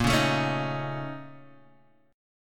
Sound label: Bb Minor Major 11th, Guitar, Standard; 6,4,7,6,4,x; strummed; chord shape